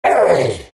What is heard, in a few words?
big pain; Dota 2; Undying